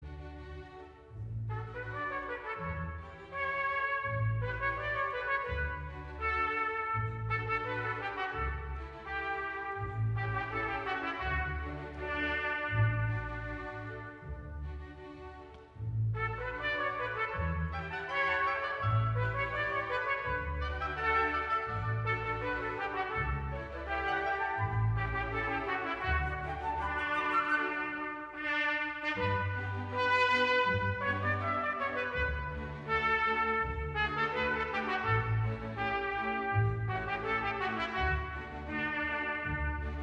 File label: Trumpet